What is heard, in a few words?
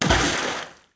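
water in; player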